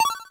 Pause In, ui; HeavenStudioPlus

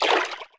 start; Heaven Studio Plus; splashdown

